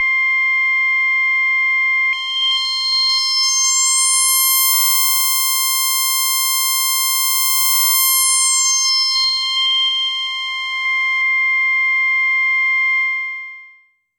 C4, SynthLine; 90 s Sample CDs; NiceBeats; SynthLine VOL-21

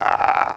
TB THROAT AH, Talk Box

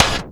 Drum & Bass, 160 BPM

